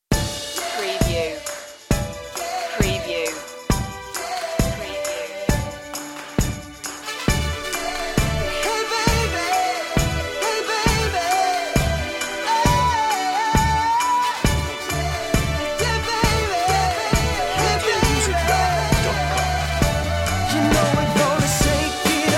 vocal

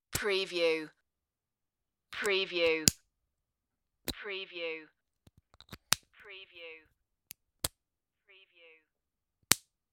marker open close

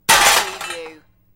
Metal Debris Impact Settle

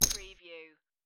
Poker Chips Two Chips Dropping On Felt

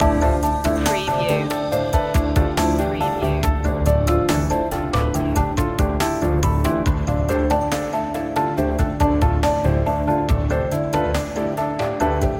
mind matrix loop

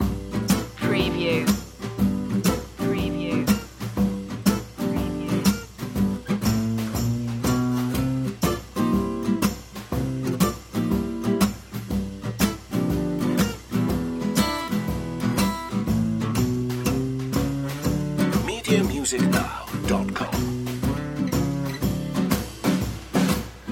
star spangled blues loop

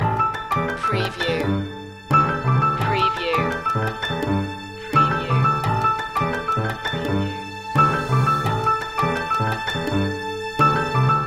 contemporary piano loop

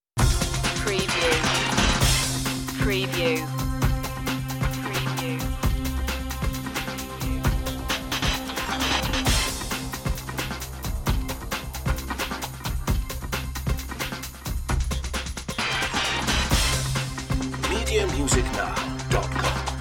funk

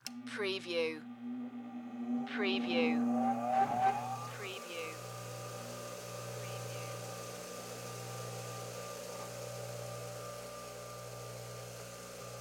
Belt Grinder Grind; sound-effects-bible

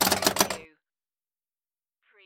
Pinball sound, multiple bumpers or switches

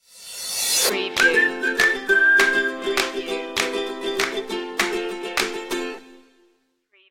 upbeat ukulele stinger

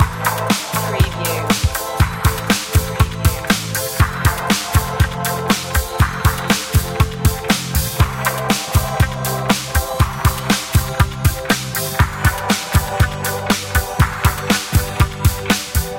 inspiring rock loop

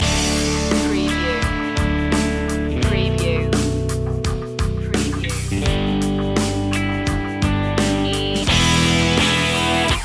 great feeling loop

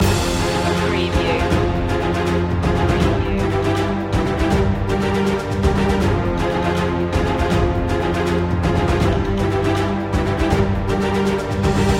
land loop

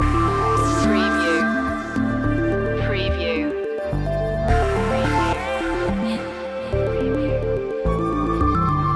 loop, chill

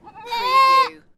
Goat - Baby Goat Bleat

